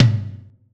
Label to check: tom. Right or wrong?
Right